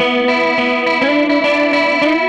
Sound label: Gretsch Guitar Loop